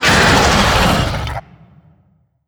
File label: gon roar